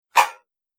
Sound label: Single Dramatic Hit, impact; Miscellaneous Impact Sound Effects; Andrew Fleming